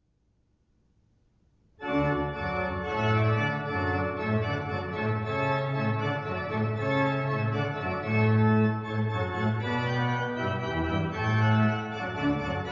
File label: Hornpipe